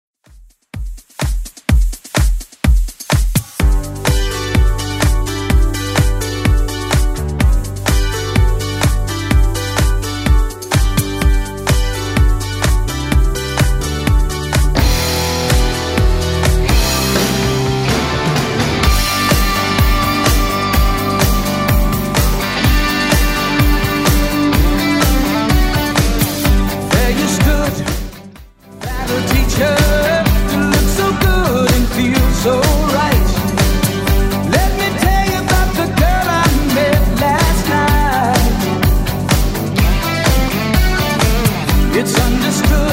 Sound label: 80s Club 126 bpm CLEAN; remixplanet